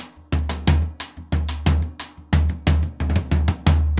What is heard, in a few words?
Surdo 120; Best Service; Festa Latina VOL-1; Drums & Percussion